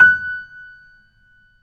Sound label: BOSENDORF